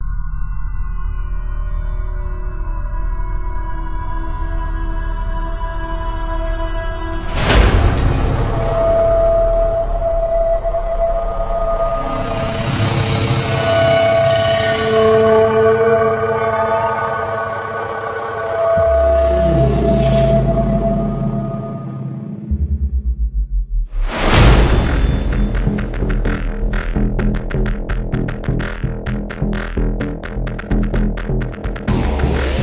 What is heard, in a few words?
Head Off (Synth Version)